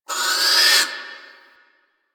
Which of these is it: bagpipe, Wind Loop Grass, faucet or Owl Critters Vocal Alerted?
Owl Critters Vocal Alerted